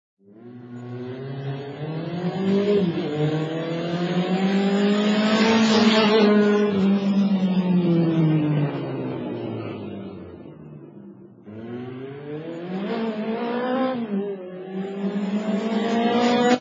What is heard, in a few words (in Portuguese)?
Vinheta motocicleta